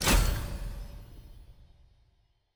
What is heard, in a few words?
button click